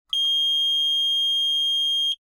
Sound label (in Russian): signal kasanija protivnika